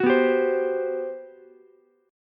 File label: G Major 11th, Ukulele C6; strummed; JGuitar